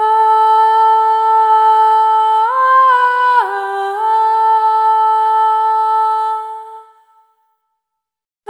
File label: Single Voice Melodic Loops, Vocal Samples